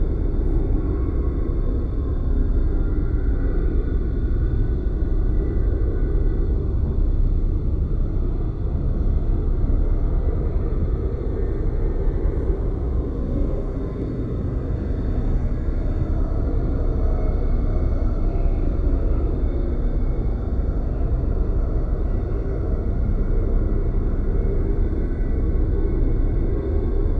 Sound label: ship enclave idle; Stellaris; overlord; Starbases